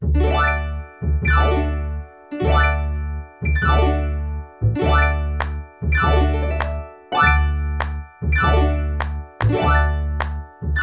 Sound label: Harp Performance; Harp Performance With Kina; Legend of Zelda Skyward Sword